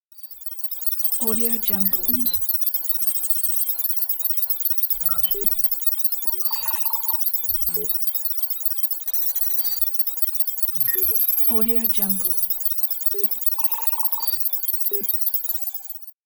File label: hitech electronic device; sounddivision